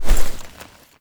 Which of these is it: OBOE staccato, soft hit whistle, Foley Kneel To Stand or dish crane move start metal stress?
Foley Kneel To Stand